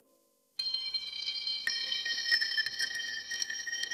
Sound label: notification